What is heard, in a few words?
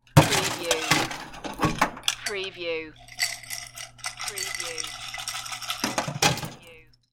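Ice Dig In Glass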